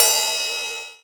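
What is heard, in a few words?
LIVE RIDE, MPC-60 DRUM; Greytsounds VOL-1; MPC-60 DRUM2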